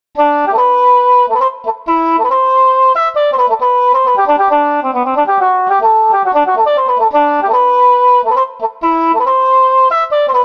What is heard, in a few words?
English Horn; montage; bois